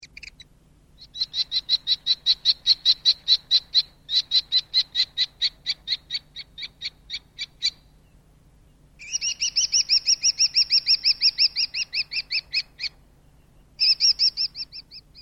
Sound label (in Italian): gheppio; faunistico